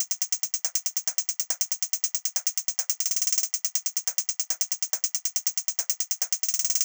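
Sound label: drum Loop 140BPM closed hi-hat; Vengeance - Essential Clubsounds Vol.5; VEC5 Drumloops; VEC5 Multi Loops 140BPM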